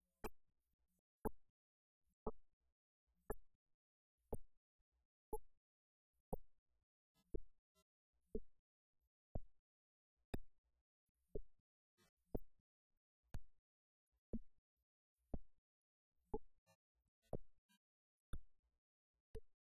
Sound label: Walnut High